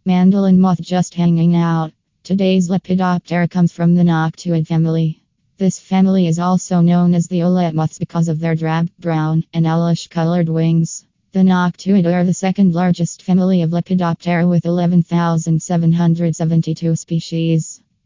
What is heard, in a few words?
mandolin